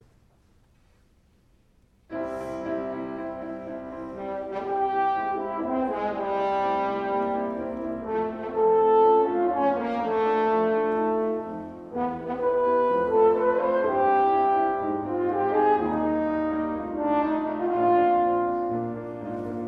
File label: Horn Sonata